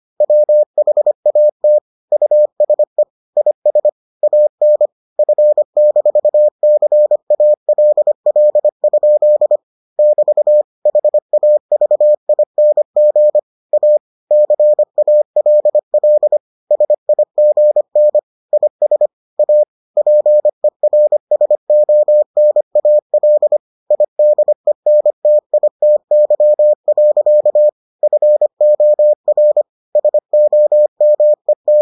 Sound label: morse